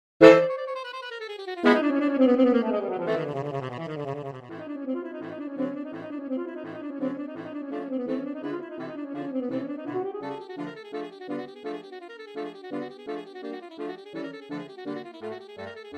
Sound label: SAX QUARTET; Rimsky-Korsakoff; Flight of the Bumblebee